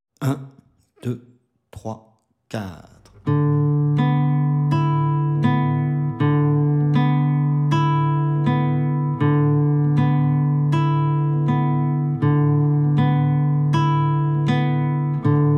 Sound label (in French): exercice double arpege 080bpm